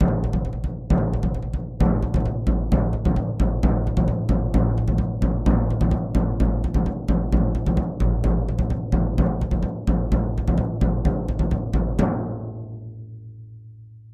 Drums Toms Rhythm, drums tom

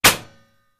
Bullet Impacts Metal

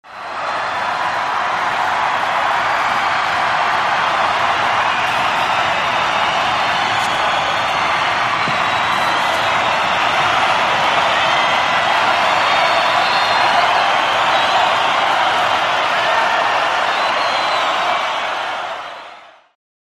Large Crowd Cheers, concert

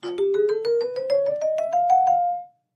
Clavichord Ascendin

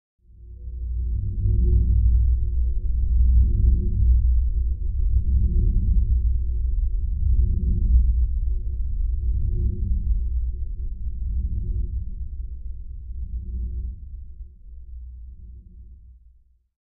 LFE Electronic Pulse with Life Flange

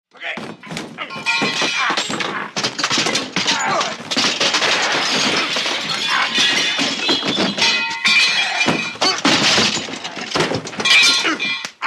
Impact Crash Fights, fight

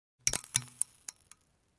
Instamatic Flash Bul, flash pops

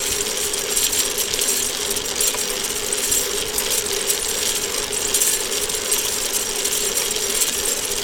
Mt Bike Pedal Loop, bicycles

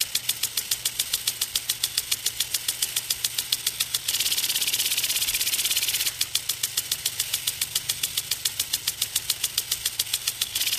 Sprinkler Plastic, sprinklers

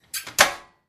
Oven Close Door; appliances

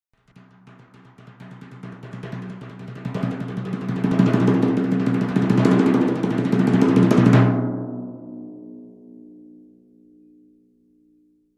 Timpani Hands Thin, mallets timpani

